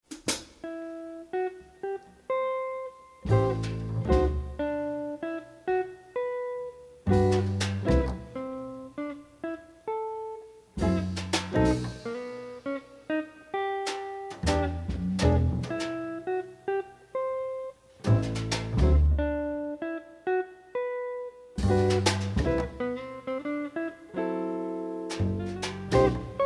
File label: Jazz Band; Washington DC Jazz Band